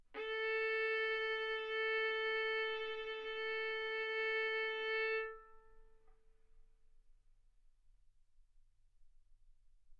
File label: Violin arco mf sul A A4 B4 stereo; single mic